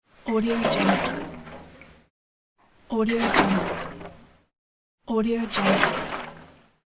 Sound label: fuel splash in jerrycan; vintervarg